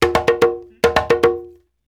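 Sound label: break drum, Reggae; Spectrasonics - Supreme Beats - World Dance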